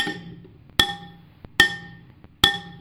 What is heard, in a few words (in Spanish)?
vidrio